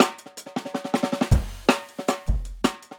160 live jungle, breaks

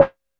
hi-percussion, techno; Techno ID; single-drums

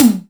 AMBSTOM, HIP KITS

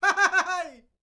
MEXICO VOICE, Vocal Planet World Voices, MEXICAN; ILIO

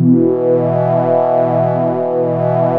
JUPITER 8 E4; Hollywood Edge - Giorgio Moroder Rare Synthesizer Collection

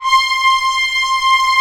ORCH C0 PP, Orchestra; Sound On Sound; Peter Siedleczek's Orchestra

